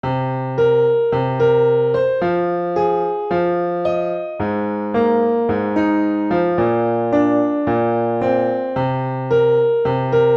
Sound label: Rhythm Practice Example